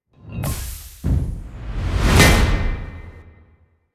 Badger Normal Attack

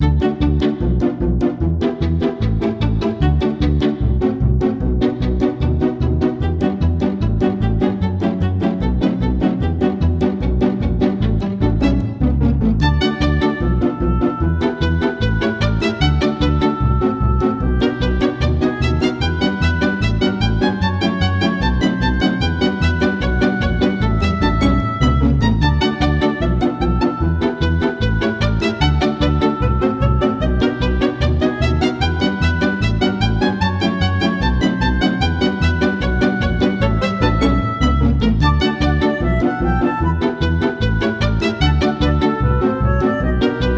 Minigames - Eating

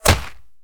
Monster Generic Foley Hit By Bullet; rampage arcade gamerip 2018

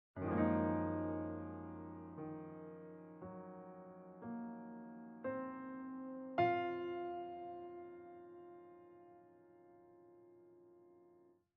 Piano End

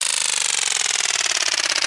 Sfx Darts

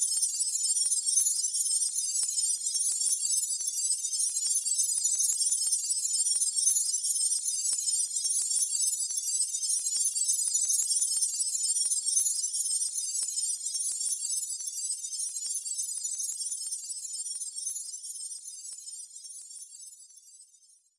Sfx Baseball

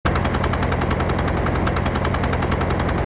Walk; starbites

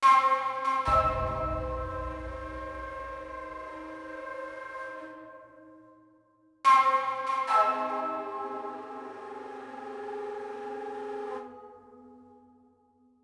pipe; Soldier of Fortune Payback; PS3, Windows, Xbox 360

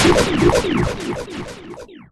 Character Explosion

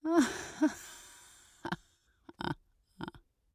AMB China 3D Amb; Rabbids Multiverse

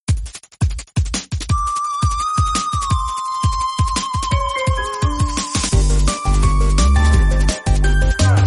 Loops Looped Intro

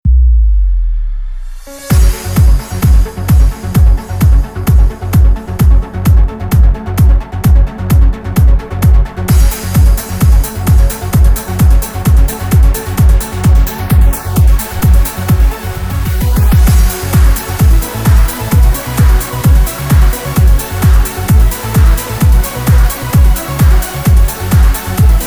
Hard Trance; Electronic Super Joy 2

